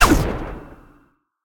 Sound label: Bow Fire; smite; god cupid skin13